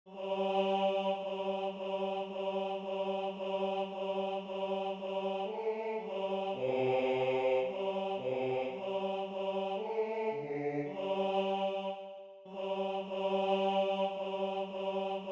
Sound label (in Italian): Alleluia Basso, Base basso; Celebrazioni Eucaristiche; Alleluia (Messa del Papa)